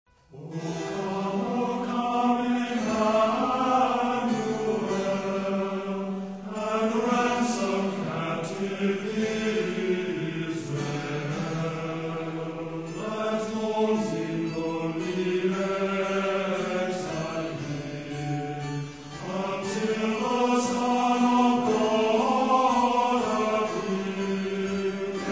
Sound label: Orchestra and Choir, Hymns